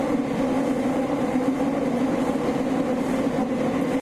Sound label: E231 sub slow curve; tetsuon